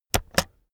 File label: keyboard external space press slow heavy